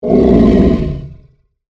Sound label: Monster Groan, Horror, Monster, Groan, Creature